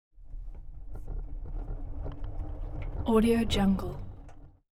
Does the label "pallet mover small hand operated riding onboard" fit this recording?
Yes